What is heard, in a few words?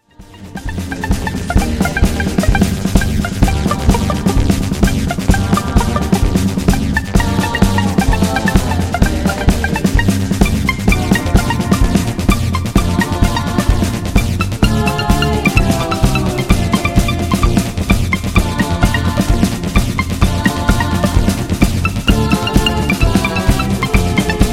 lounge